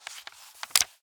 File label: Book Page Turn; Office Supplies; 1001 Sound Effects